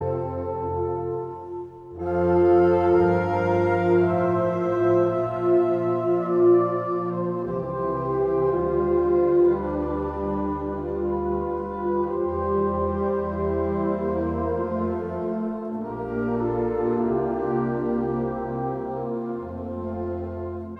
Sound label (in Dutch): Trompetterkorps, Largo